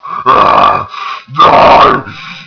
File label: gar alert